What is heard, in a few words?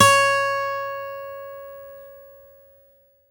HRD PICK; Quantum Leap; Guitar & Bass